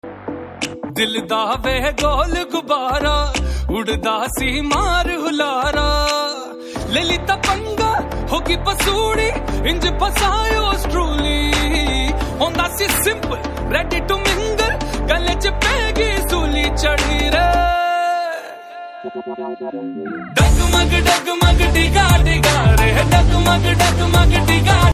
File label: Ringtone